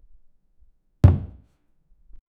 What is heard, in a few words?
put the ball on the, ball